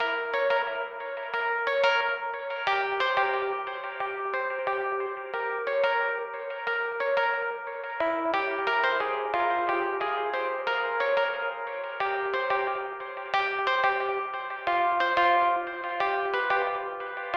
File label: Rhodes; Toki Tori 2